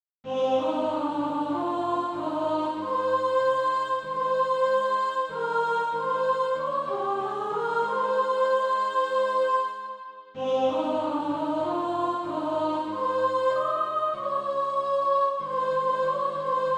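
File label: soprano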